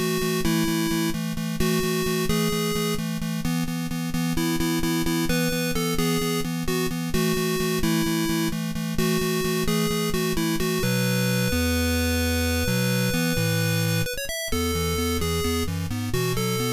8bit

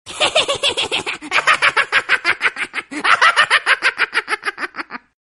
Funny Laugh

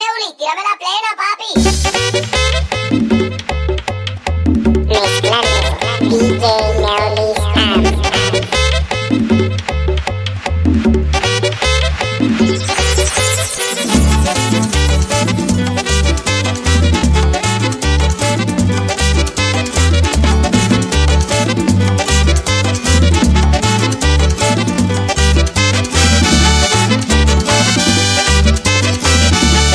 merengue

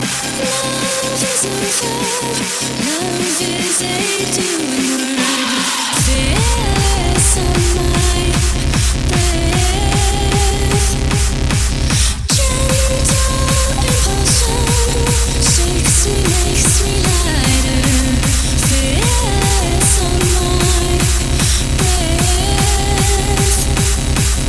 Hardstyle

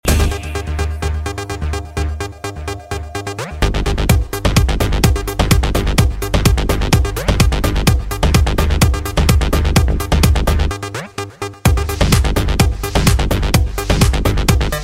Drum Beat

